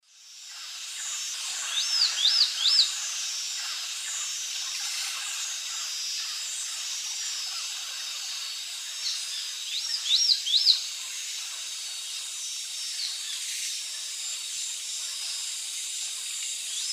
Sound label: Myrmotherula sunensis, songs and call, S. America Eastern Lowlands